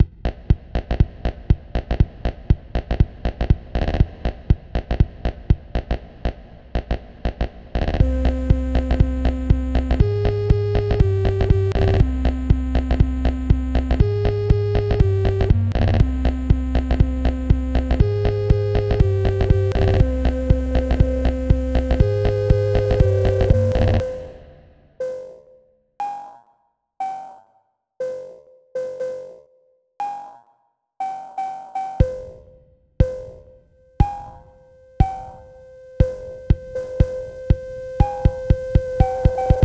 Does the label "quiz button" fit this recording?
No